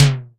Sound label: SIMMONS SD-5 drums